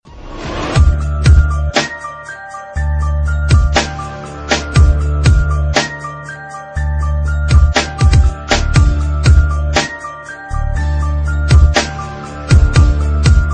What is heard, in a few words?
best underground beat